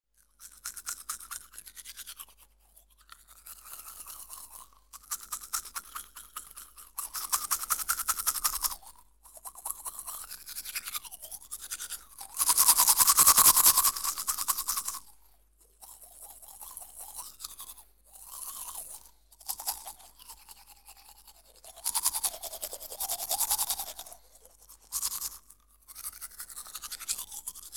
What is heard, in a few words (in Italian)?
LAVARSI I DENTI SPAZZOLINO